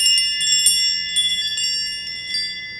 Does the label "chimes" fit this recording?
Yes